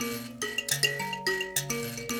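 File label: grand mbira phrase, African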